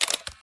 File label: photo click